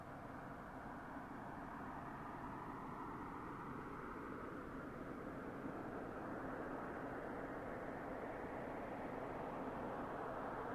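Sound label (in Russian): priblijenie avto, approaching car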